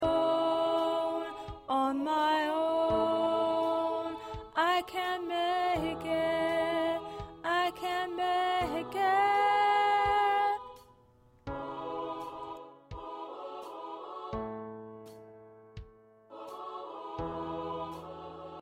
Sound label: Lead